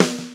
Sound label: Snare